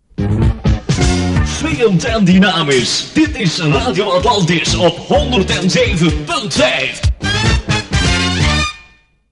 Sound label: jingle